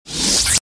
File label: forcefield off; movers